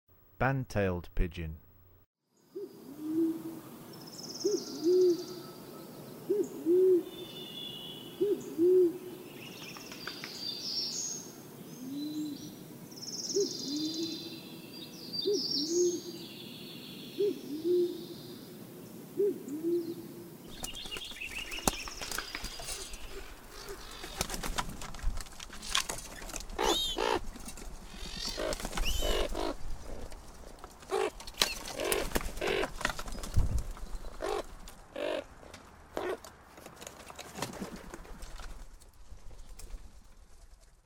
Band-Tailed Pigeon, Songs of Birds of North America; Western USA; Western USA Songbirds